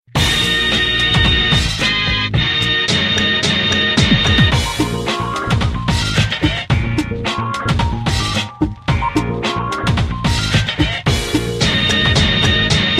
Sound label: Samsung Galaxy S2